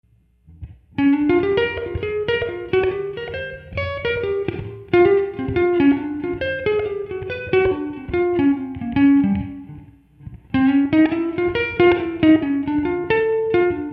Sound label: improvisation blues in Bb, solo guitar; Ted Greene